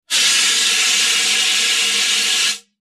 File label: High Pitched Fire Ext, fire extinguisher